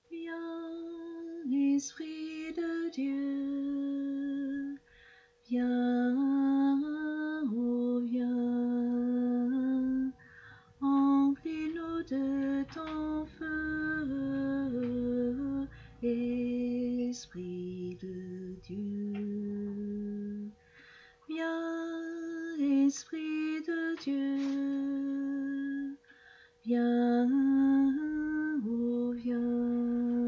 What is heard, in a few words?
alto